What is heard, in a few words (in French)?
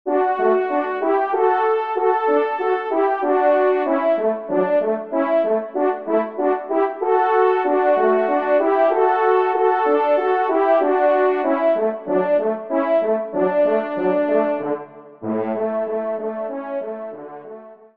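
duo, ensemble, trompe cors; Kling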